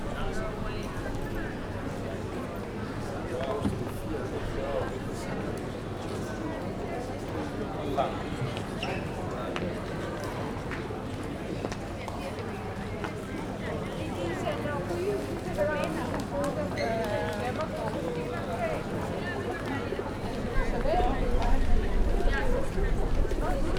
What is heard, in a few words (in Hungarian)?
jobbrol fel a hidra, utca; velence; olaszorszag 2014